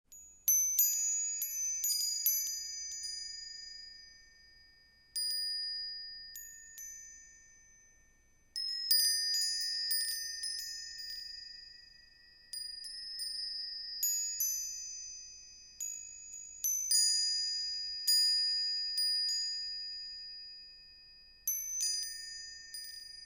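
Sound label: diamond shimmers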